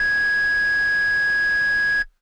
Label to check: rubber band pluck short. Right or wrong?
Wrong